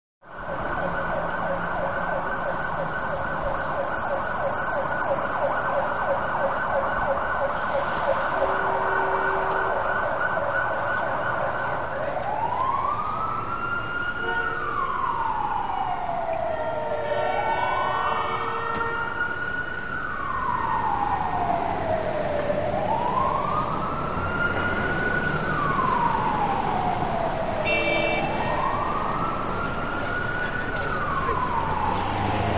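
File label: Distant Siren City Traffic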